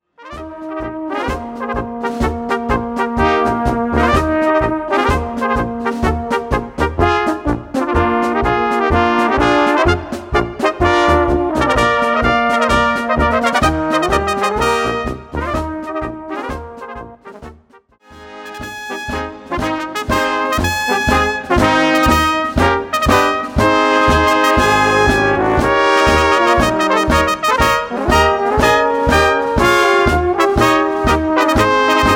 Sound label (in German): Blasmusik